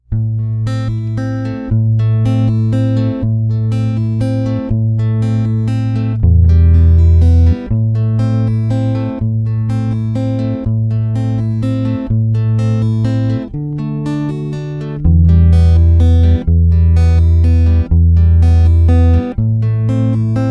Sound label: Scarborough Fair guitar only